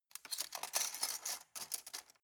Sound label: cutlery plastic multiple drawer place soft